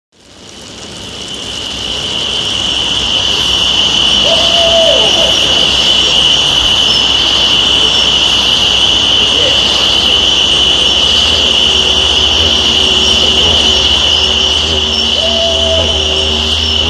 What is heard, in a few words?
didj; Didjeridoo; GO PARK THE VAN; night recording